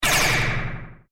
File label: Laser Corridor Blaster, laser gun, science fiction sound effect; Andrew Fleming